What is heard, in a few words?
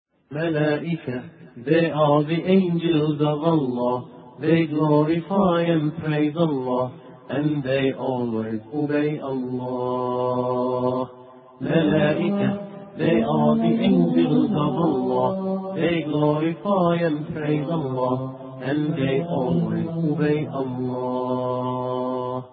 Islamic Ringtone